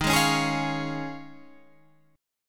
D sharp 9th Suspended 4th D sharp, Guitar Half Step Down